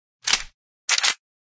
Pistol Reload

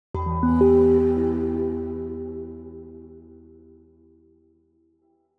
Quest Failed; Coral Cove

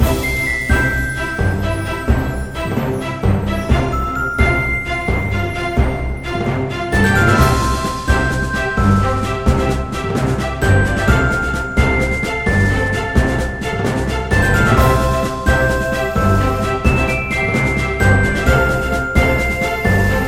BGM Battle; Cookie Run Kingdom

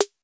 skull bounce

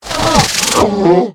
UV Pain; Devour; The Inn; Zara

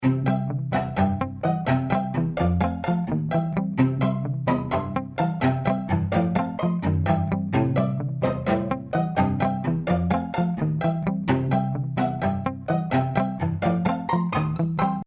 Indoor Harmonica; Indoors

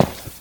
Footstep rock

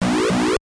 Special Punch; Mike Tyson's Punch-Out!!

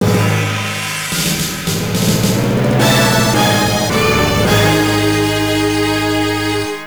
Gravestone (Game Over); Metal Slug 3; snk neo geo arcade

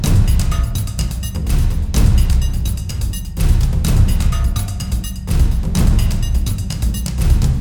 danger funny; Identity V

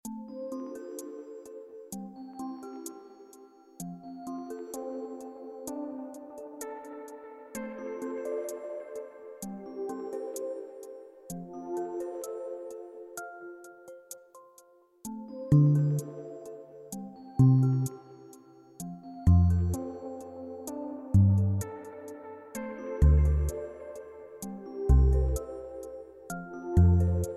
Cheerful Ambient; Pixel Starships; gamerip 2016 pc ios android

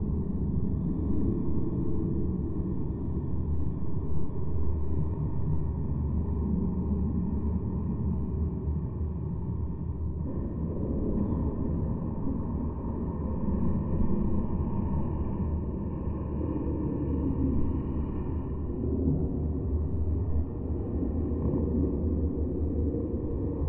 amb luncheon spooky tree

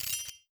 minigame lock solved

